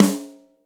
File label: subtle reverb steel snare drum sound g key